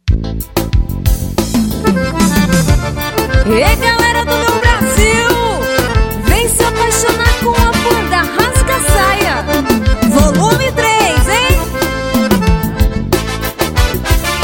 voz

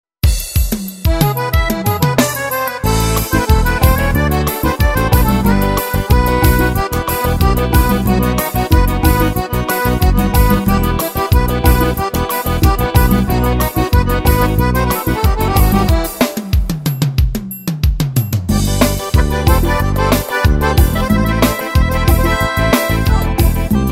xote

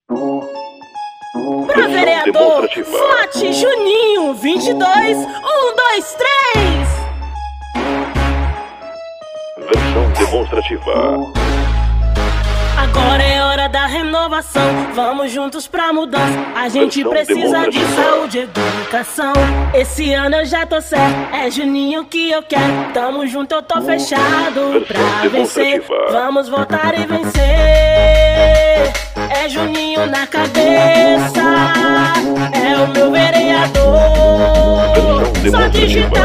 jingles politicos, funk; Jingles Politicos 2020; Combaty; Studio Lako

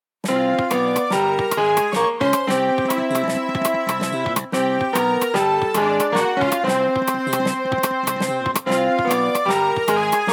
Jazz Irado

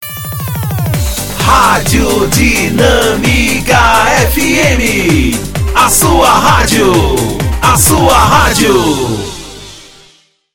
vinhetas cantadas radio dinamica fm

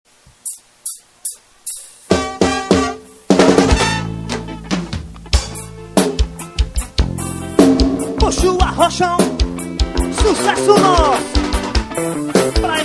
forró

